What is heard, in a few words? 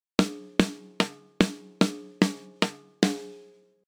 snare on